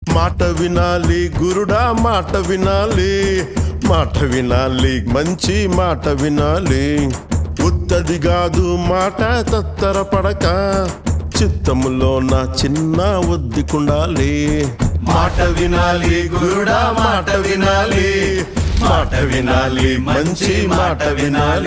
Ringtone; telugu

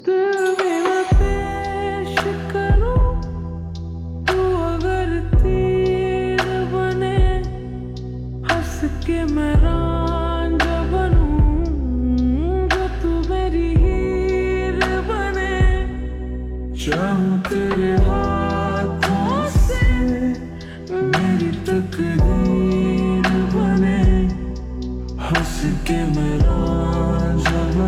Slowed Reverb

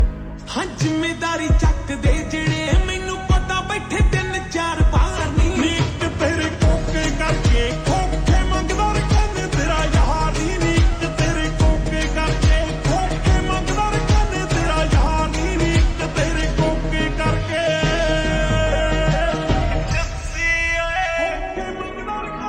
Slowed Reverb

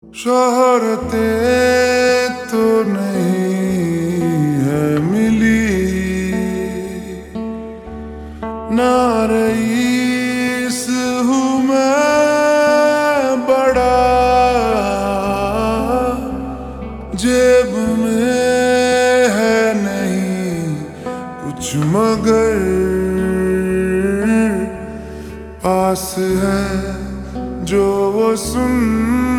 Ringtone Slowed Reverb

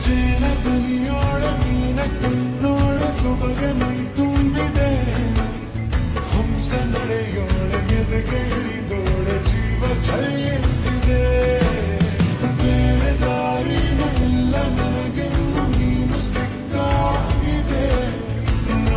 Slowed Reverb; kannada

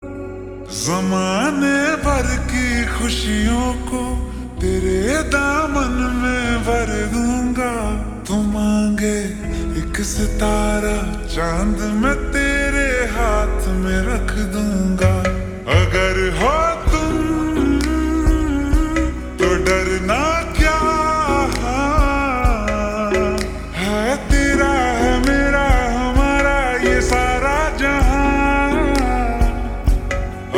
Ringtone Slowed Reverb

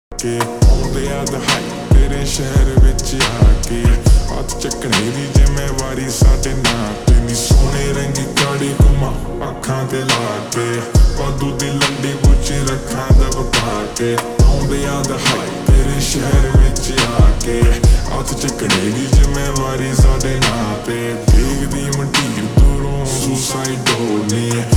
Slowed Reverb

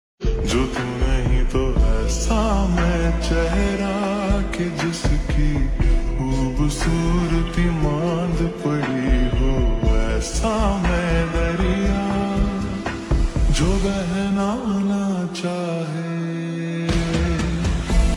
Slowed Reverb

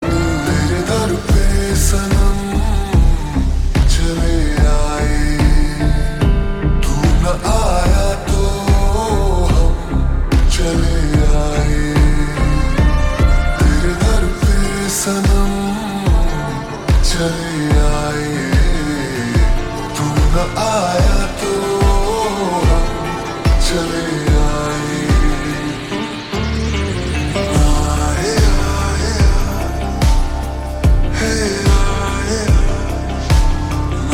Ringtone Slowed Reverb

